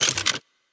Weapon Reload

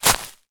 foley footstep single dried long grass

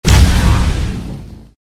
Equip; Criminality

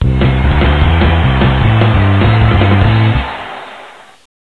Round Completed; Stunt Driver Online

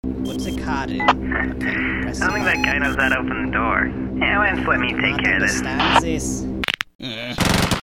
Engine Fans; Infiltrating The Airship

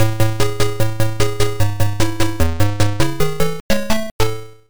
Lose; mahjong sisters; toaplan; arcade

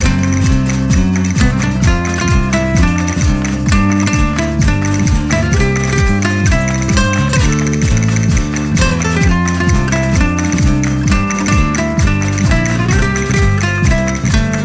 Opponent Down; Don Flamenco

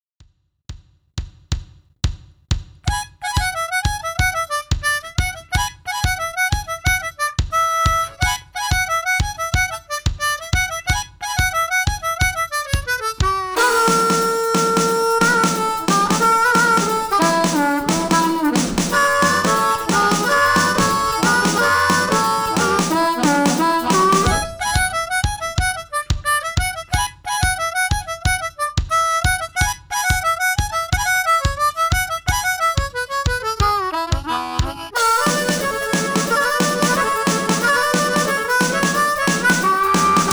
Level Complete; lucky luke; psx

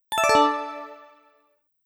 Ringtone; devilman crybaby original soundtrack 2018

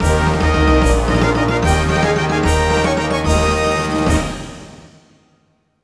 1st Place Trophy Jingle; Nicktoons Racing

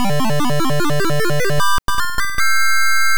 Kick Success; Gridiron Fight; arcade

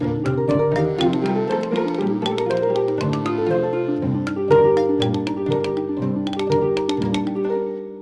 classic xylo; music world

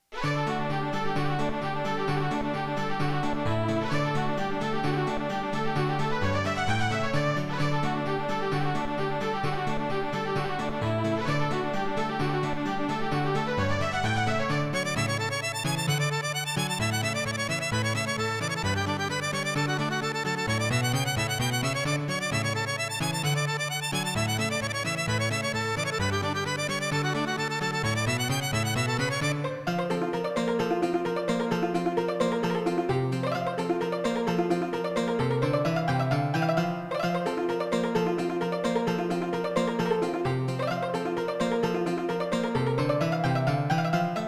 Hornpipe; Sid Meier's Colonization

